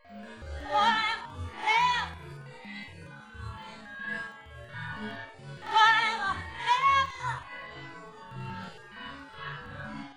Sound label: lead voice; sound source separation; ADRess